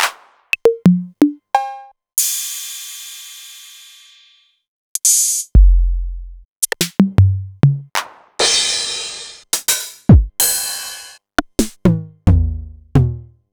Novation Drumstation; DrumstationV1

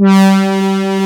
C4 brassy, K1000; East West; Global Sounds

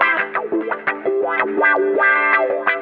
GUITAR

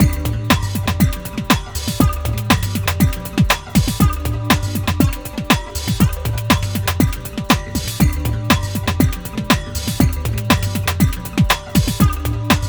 Elektro, Processed Percussion; Sonic Foundry (Sony Creative Software) - Rhythmicronics Processed Percussion

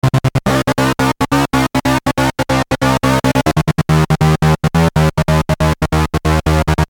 Syn-Bass m C, Techno Synth Loops, 140bpm; Sonic Foundry (Sony Creative Software); Signals I; SynBs

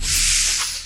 PLAS FX; USB Soundscan vol.24; AKAI; Industrial Loops

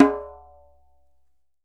DJEMBE

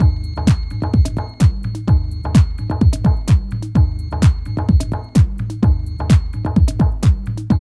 LOOP, Tekno Industrial; East West

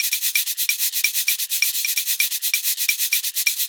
Live Percussion, 130 BPM

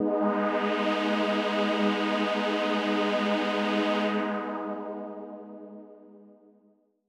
Dramatic G#3; KG MegaSaur

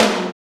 TOM TOM, Real

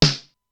SN, Simmons SDS-9; drum machine; Factory C1

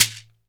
SHEKERE, Synclavier Percussion; ILIO

